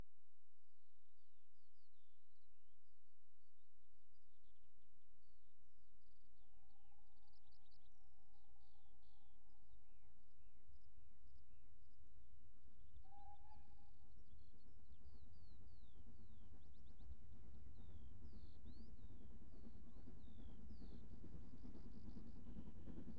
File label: Train Coasts Medium Speed With Wh; BBC Sound Effects Library; Age Of Steam